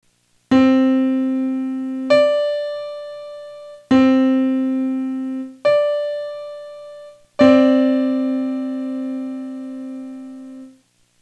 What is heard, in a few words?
Not Octaves, Pitch; Music by the Numbers